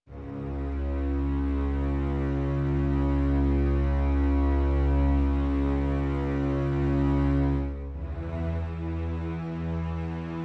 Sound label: Low Strings